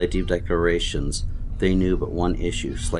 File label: noisy